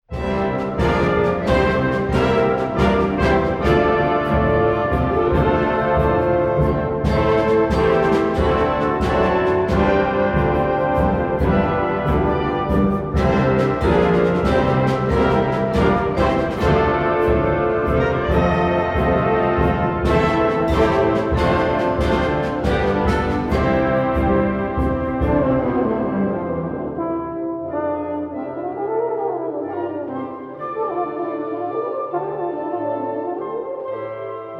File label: Concerto for Euphonium and Wind Band